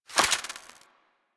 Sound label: Mode Switch To Auto, Pistol; Weapons; Grineer; Amphis